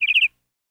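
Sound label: Meadow Lark Happy Chirp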